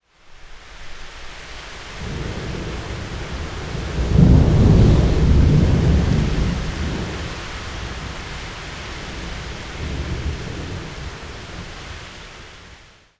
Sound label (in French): Orage